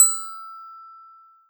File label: E6 bell